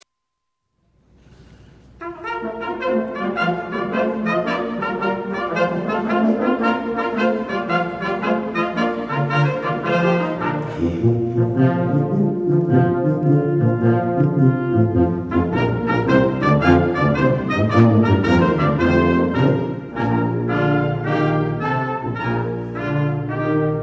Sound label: I Saw Three Ships; christmas